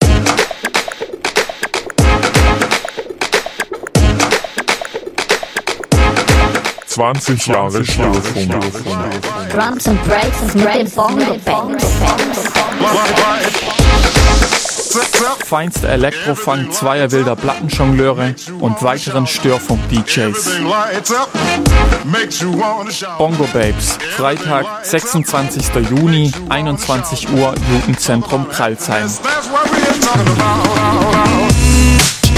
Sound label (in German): jingle tanzabend bongo; bongo babes